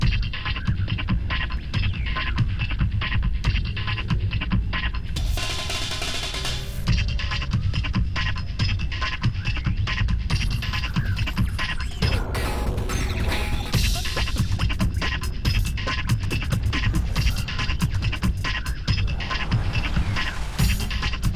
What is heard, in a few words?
Action Music Interior Beginning; True Crime New York City; Xbox